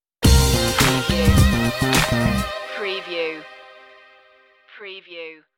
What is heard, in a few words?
funky stinger